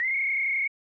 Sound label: WHISTLE; John Madden Football; snes